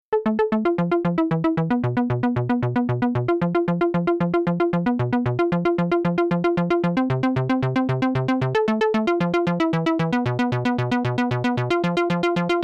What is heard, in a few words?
Roland tb 303 114bpm C Am